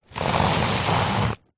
large green waste garden bag full plants foliage slide ground; household